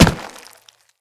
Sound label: Infested Runner Step Default, Footsteps